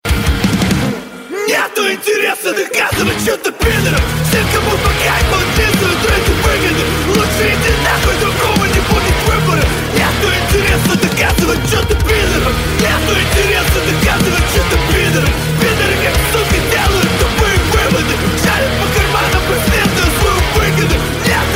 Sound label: metal cover